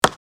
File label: Monopoly board game token single move